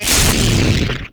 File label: Fire Stop, arc rifle; Infested; Coda Synapse